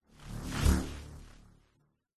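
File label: science fiction power surge passby buzzing humming movement; zapsplat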